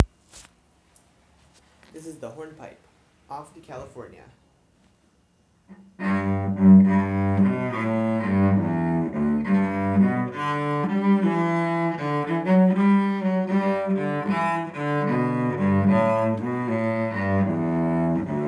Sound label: Cello; Off to California